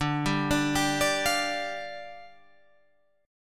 D Minor Double Flat 5th, down, Guitar, Open G

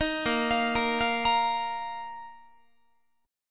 A sharp Suspended 4th Guitar; A#sus4 arpeggiated; 18,18,20,20,21,21

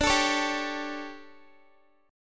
D Major 7th Suspended 4th, Guitar; DM7sus4 strummed

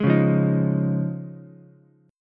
C sharp Major Add 9th C sharp, Ukulele; strummed; chord; JGuitar

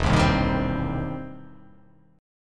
E Minor Major 7th Sharp 5th; chord shape

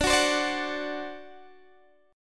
D 7th, down; D7 strummed

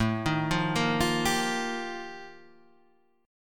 F 11th A, chord

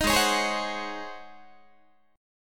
Ab 11th Ab Guitar; 18,16,16,18,16,17; Ab11 strummed

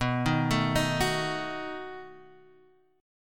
B 7th B down

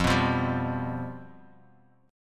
F Suspended 2nd Flat 5th, F, Guitar, Open D; 3,2,3,1,x,x

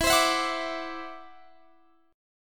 E 7th Suspended 2nd Sharp 5th, E, down; E7sus2#5; strummed

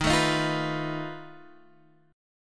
B 7th Flat 5th D sharp, guitar chord

